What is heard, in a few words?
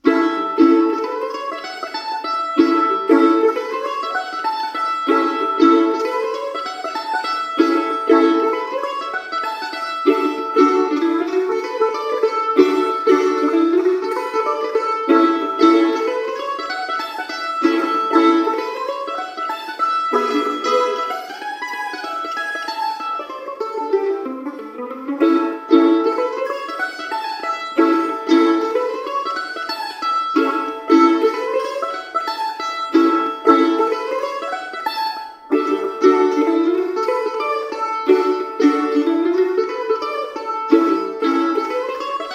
wah wah